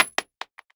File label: 556mm shell wood; Escape from Tarkov